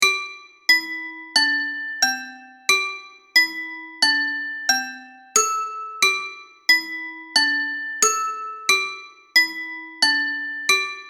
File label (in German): Kinderklavier mit Tape Delay